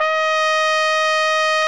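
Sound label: brass, cheese trumpet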